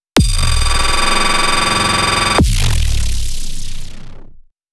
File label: Raw sound